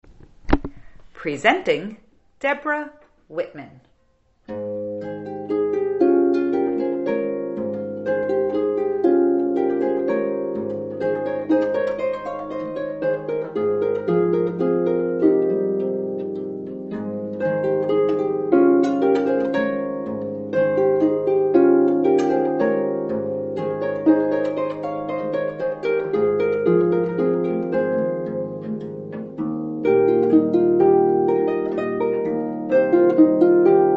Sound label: Flower Duet, piano